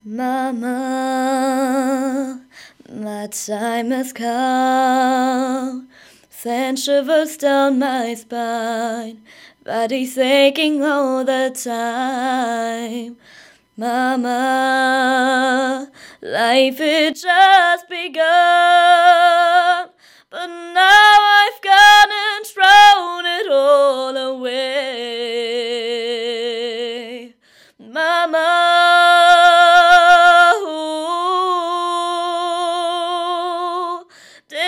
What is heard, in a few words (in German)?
Gesang